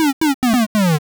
jingle die

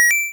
item get

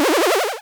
power up

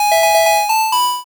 jingle interval

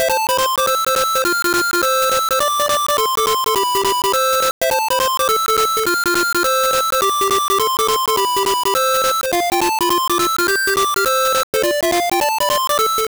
bgm classic smetana moldau

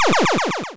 power down

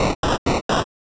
jingle stairs

